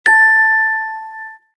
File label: Email Semplice; Vertu Constellation Quest